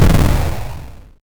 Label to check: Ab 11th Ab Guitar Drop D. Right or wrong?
Wrong